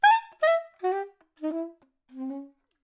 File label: gracenote